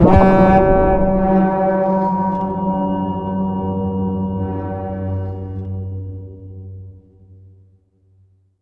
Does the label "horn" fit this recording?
Yes